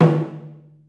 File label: Taiko High; sudoku party; wii u